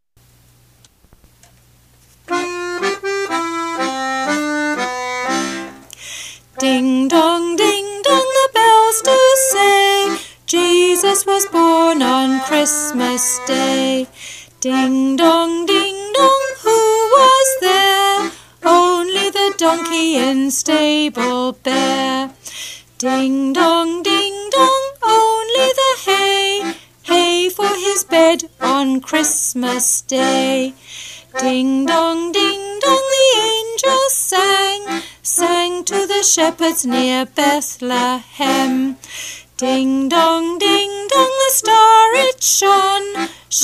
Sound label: Ding dong ding